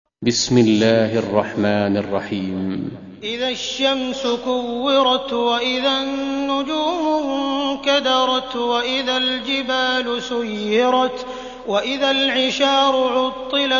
Tekvir, kuran; sudeysi sureler

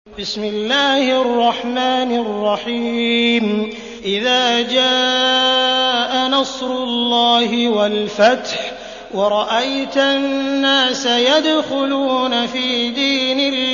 Nasr, kuran; sudeysi sureler

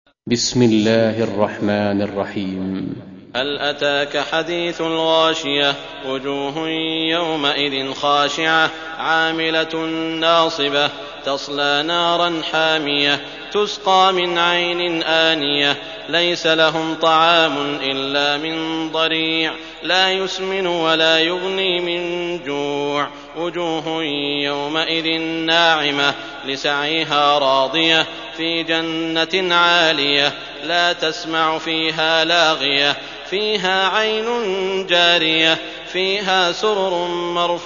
Gasiye, kuran; sudeysi sureler

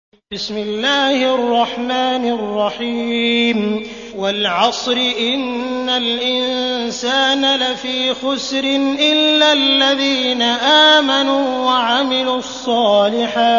Asr, kuran; sudeysi sureler

